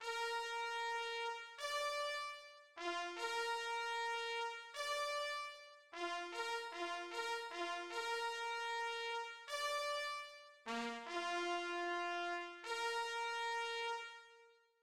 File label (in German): Jagd vorbei